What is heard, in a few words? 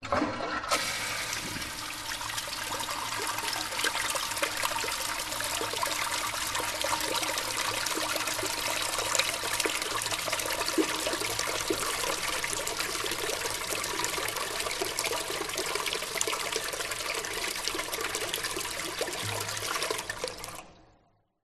public places public toilet urinal flush metal